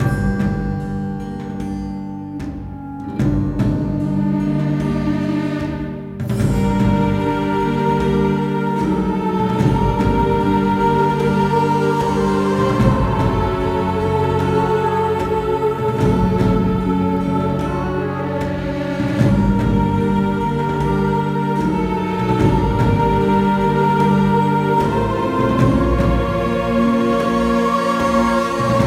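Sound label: Music Ambience; Music Amb Salazem; Alice in Wonderland; Nintendo Wii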